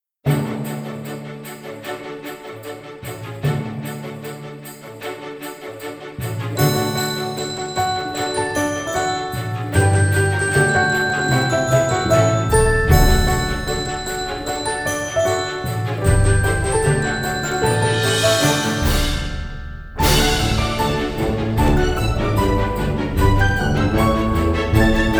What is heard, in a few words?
Jingle Bells